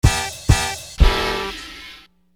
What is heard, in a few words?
Horn Loop, Hip-Hop; Construction Kit; Sonic Foundry (Sony Creative Software)